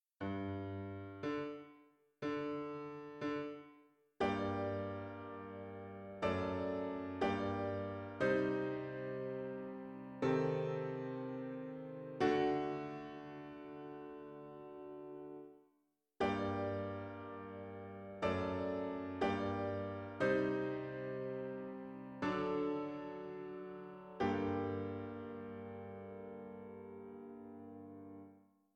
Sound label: Piano